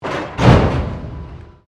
Construction Concrete Impact Metal Big, Large concrete pillars being dropped